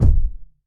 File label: hot kick one shot d key